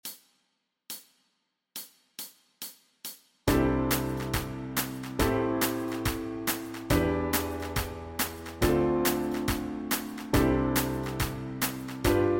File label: Backing Track, walking bass